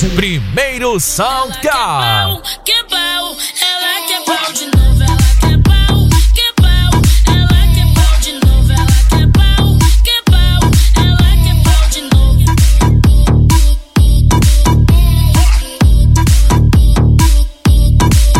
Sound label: sound car